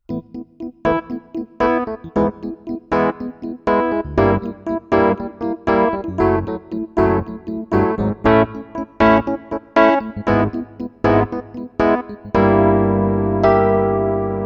EPiano

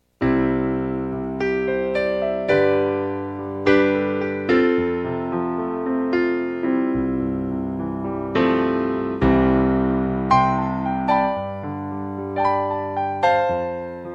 PC3LE Pianos

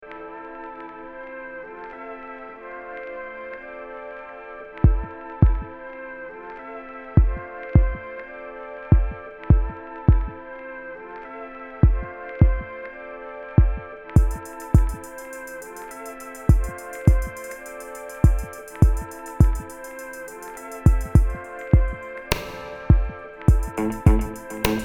Loop

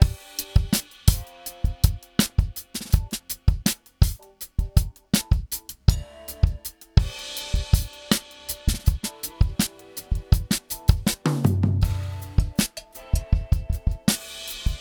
Chandler Limited Zener Bender Software DRUMS; Softube

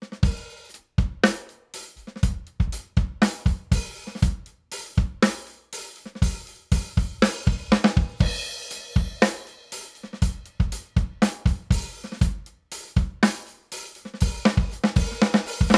Compressor Drums Glue; SSL G Series

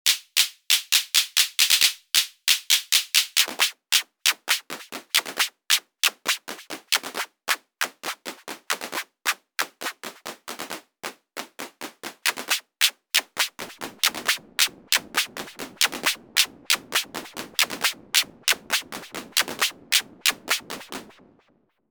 CLAP CV Tone

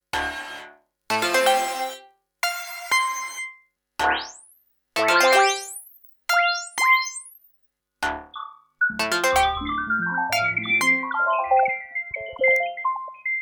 modal; logidy epsi; factories 600

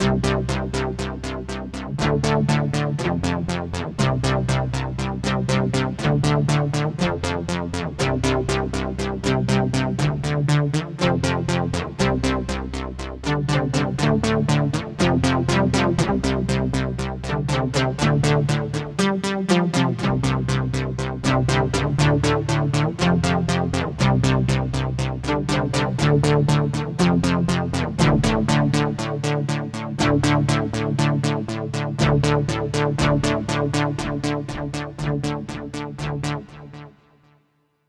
Boss SY 1000

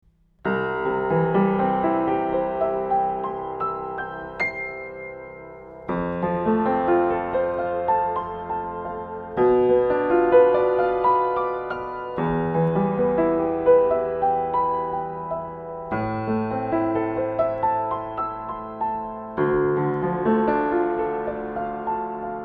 AKG414XLS Piano Wide Stereo

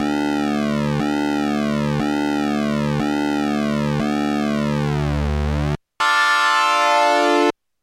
MODOR NF-1 Sync Osc

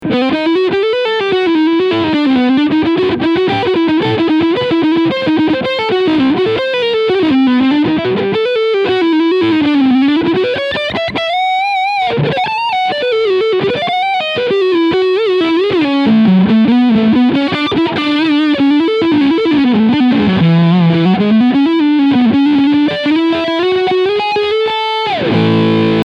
Leadsound Front PU

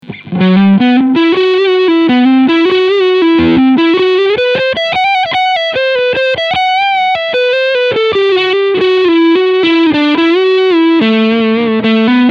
Fender Player Plus Tele Leadsound Front PU

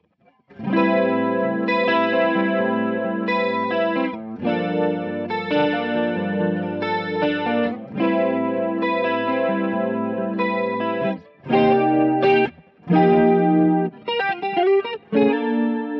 Boss DC 2 Mono

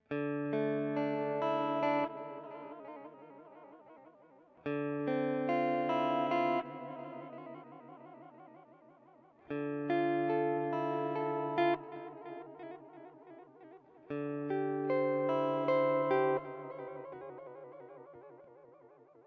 Meris Polymoon Late Modulation

